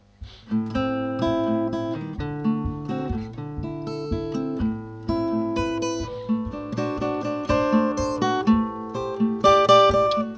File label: guitar